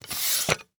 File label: Tripod Light Tripod Extending The Legs; AdobeCC